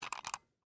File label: Mouse Click Twice, Technology, Mouse, Click, Twice, Wireless, Laptop, Mouse; Computer; BlastWave